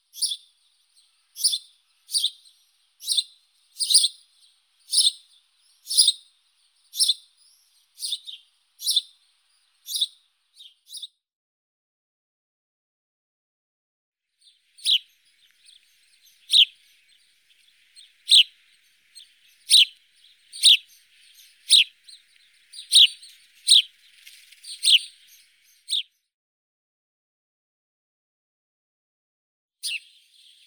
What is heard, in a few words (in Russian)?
vorobei domovii passer domesticus, zvuki prirodi